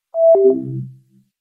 oculus shutdown

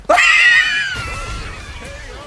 wheeze

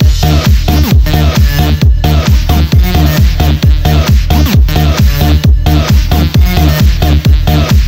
hyper music; mugen number muncher

